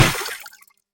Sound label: Bullet hit water; Hovl Studio; SFX Battle sounds; WhiteMan Unity2D